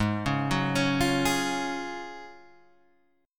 Ab 13th Suspended 4th Ab; arpeggiated; JGuitar

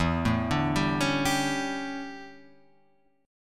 D Major 7th Suspended 2nd Suspended 4th

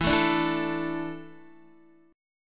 E 7th Suspended 4th, Guitar; E7sus4; strummed; x,7,7,7,4,4

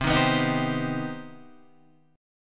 C Minor Major 7th C Guitar Open G

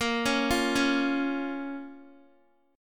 A sharp Minor, down, Guitar; 4F,4A,5C,5G,5C,6E; arpeggiated

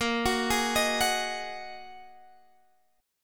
G sharp 7th Suspended 2nd A sharp

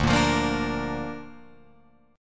D Minor Sharp 5th D down Guitar Open F; Dm#5 strummed; JGuitar

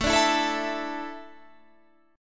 A Suspended 4th, Guitar Standard; Asus4 strummed; JGuitar

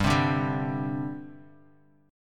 G Suspended 4th; Gsus4 strummed; JGuitar

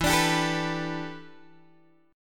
E Minor 11th guitar chord, Open G

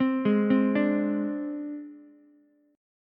G sharp Major Flat 5th, Ukulele; G#Mb5 arpeggiated; 5,8,8,5

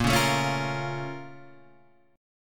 Bb Minor Major 7th, Guitar, Open G

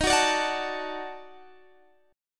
D sharp Minor Major 7th Flat 5th, Guitar Standard; strummed

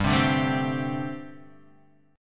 G Suspended 2nd Suspended 4th; Gsus2sus4 Chord {3 3 0 2 1 3} strummed

